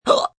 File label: Hiccup Throaty, Hiccup, female, throaty (human voice); Hiccupping; VoiceBox